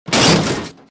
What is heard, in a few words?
metal break